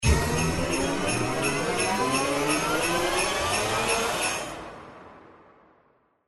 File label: Anticipation, SLOTS; Christmas Blast